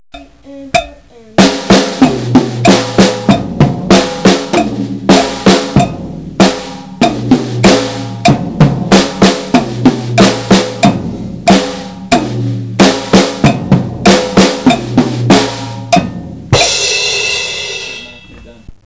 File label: josh fills, drums